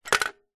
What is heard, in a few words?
battery power tool charging dock set down floor; industrial; ryobi